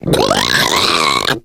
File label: female boomer warning; voice; Left 4 Dead 2